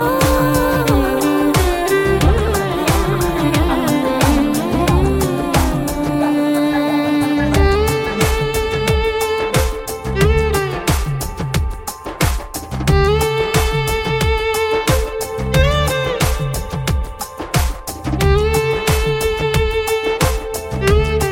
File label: folk Music, telugufolk